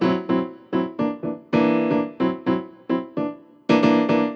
110 NuJazz Piano Dmaj, Grand Piano; Digidesign; Pro Tools Essential Loops

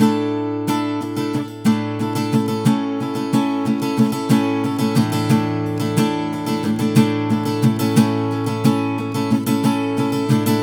Pop Ac-Guitar Strum (Sus4) Amaj; Pop Rock; Acoustic Guitar; Pro Tools Essential Loops; Digidesign

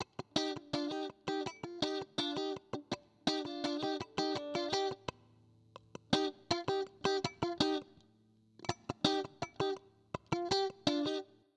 Soul Rhythm Guitar Cm; R&B Funk Soul; Digidesign; Pro Tools; Pro Tools Essential Demos; Essential Loop Demos; Demo Session

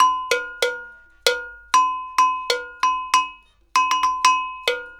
096 Hip Hop Agogo, Bells, Percussion; Digidesign; Pro Tools; Pro Tools Essential Loops

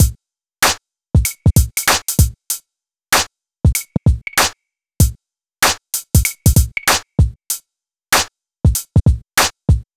E Coast HipHop Drums

